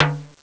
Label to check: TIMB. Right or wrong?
Right